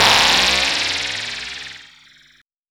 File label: CROW; EZRollers